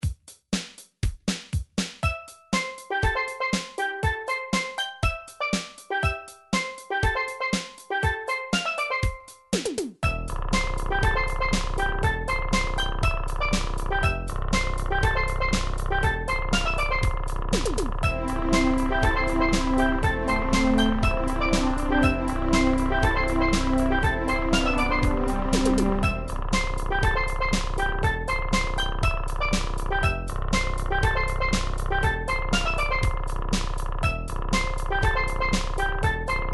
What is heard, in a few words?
overworld smash drums remix; Game System Super Nintendo; Super Mario World